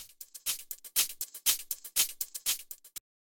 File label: parallel saturation hihat